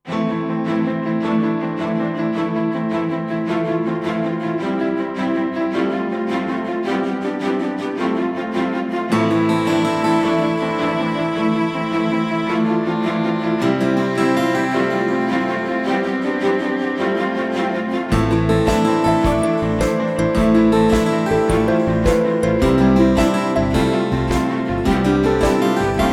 uplifting way

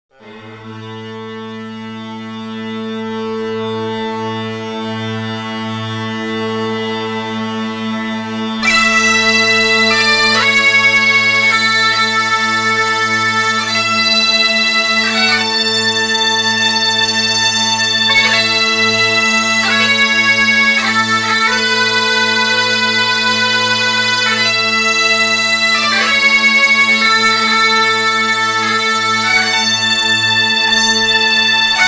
acoustic highland

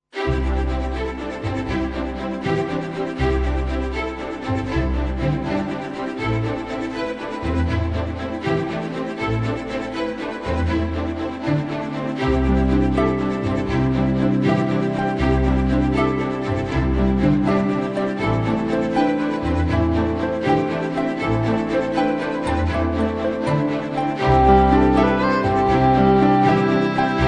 uplifting fields

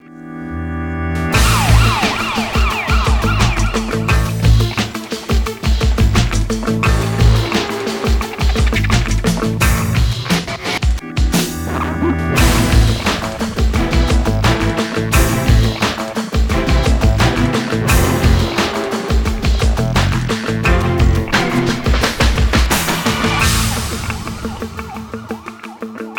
electronic street law

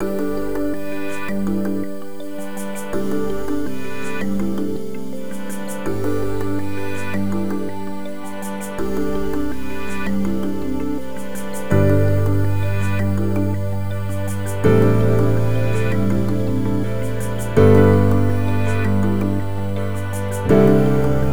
uplifting

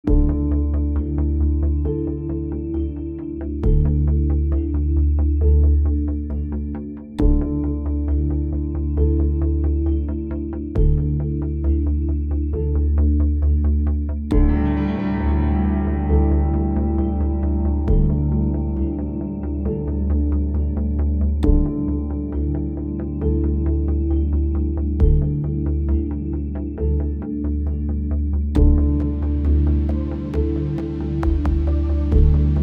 tension undercurrent dark

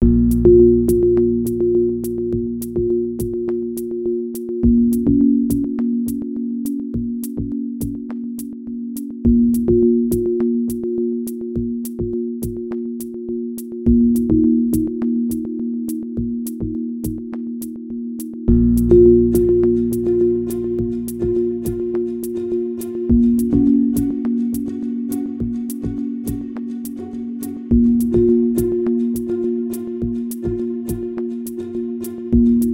tension impasse

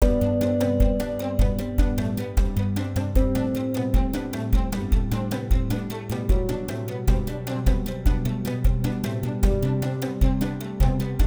uplifting golden

